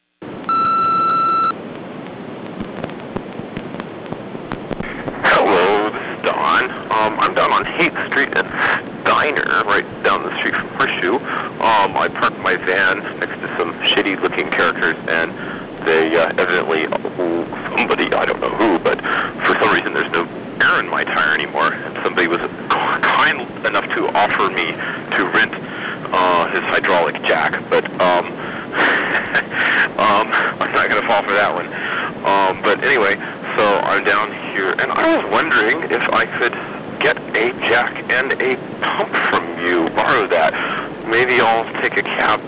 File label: slashed tire; Here I sit in my van, in the light of my powerbook and a Scentinella Insect Candle, waiting for a $40 tow truck to arrive